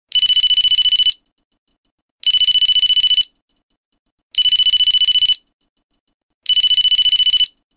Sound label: Digi Ringtone